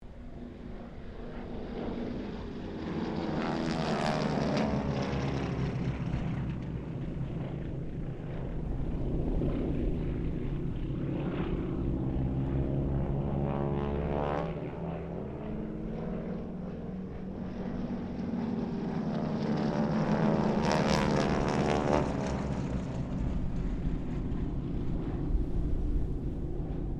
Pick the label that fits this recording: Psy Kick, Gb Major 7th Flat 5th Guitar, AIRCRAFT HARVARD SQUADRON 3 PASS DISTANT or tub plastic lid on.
AIRCRAFT HARVARD SQUADRON 3 PASS DISTANT